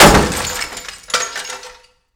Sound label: metal break